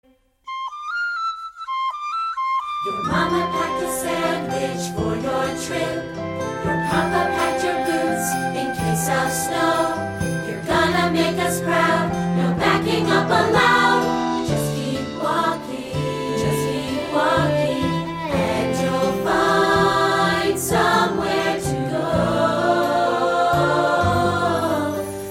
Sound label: With Vocals